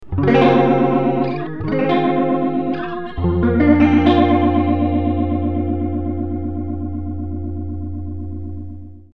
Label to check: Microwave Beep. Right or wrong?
Wrong